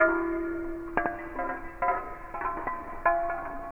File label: Tribal Glass Loop